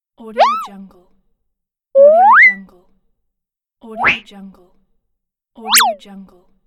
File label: comedic item throwing